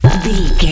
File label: sci-fi spark electric device active